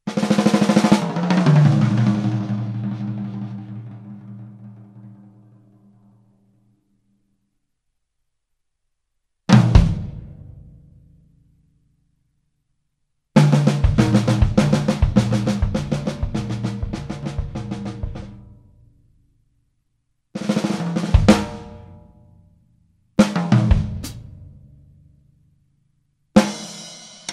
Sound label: Dessert of Drums, percussion; written G.Edlinger; Timeline: 3 / 2 Clave; Instrument: Drums